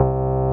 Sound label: MOOG BASS